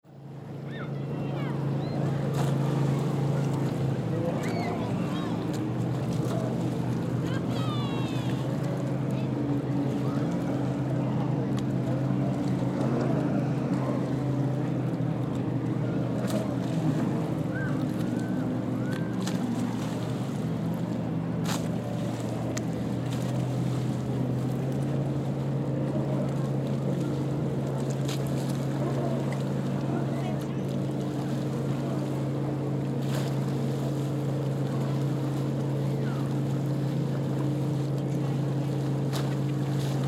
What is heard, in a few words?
lakeside atmo usa